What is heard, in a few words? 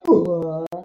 ouah